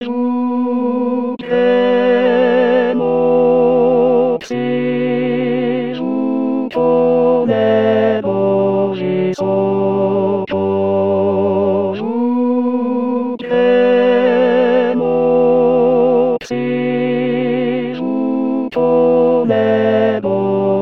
tenor

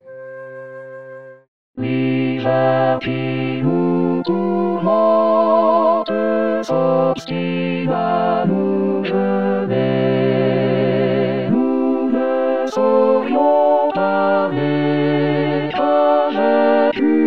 tutti; Choeur de l'hiver

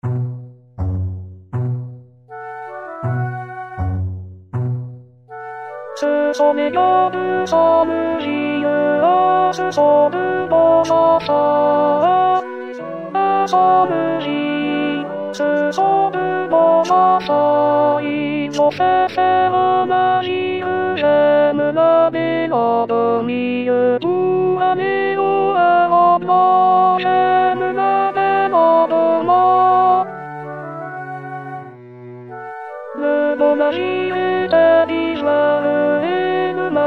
alto; 2026 saint jean

